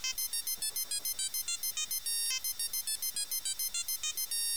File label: ringtone; spy; gta iv